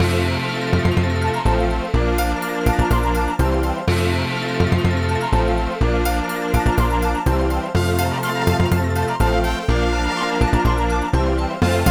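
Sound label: Balloon Fanfare; Diddy Kong Racing DS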